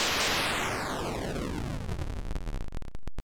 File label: Thunder